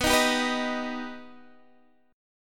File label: Eb Augmented B